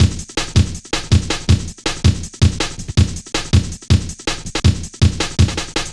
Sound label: boom, Drum Loops; Jungle; Boom 161.5; Zero-G Jungle Warfare Complete